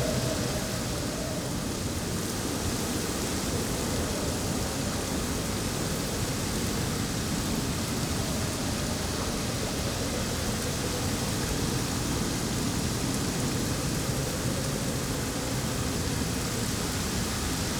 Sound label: ambience arctic beach day calm rainy; Rust